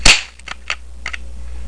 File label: shoot